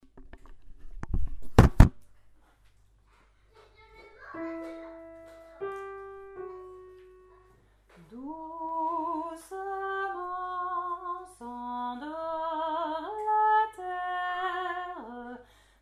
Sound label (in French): Berceuse cosaque soprano